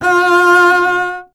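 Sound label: string contrabass F; Miroslav Vitous; Solo Instruments VOL-2; Solo 2 Bank 1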